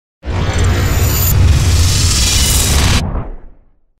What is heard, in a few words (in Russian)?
perehod kiberpank